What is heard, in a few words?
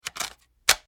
Click Mechanical Weapon Clip Latch; Latches (Sound Effects:Objects)